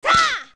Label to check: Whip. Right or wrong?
Right